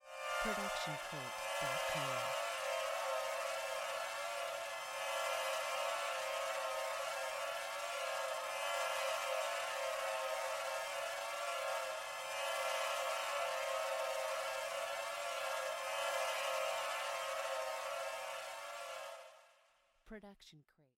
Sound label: Vertigo High Swarm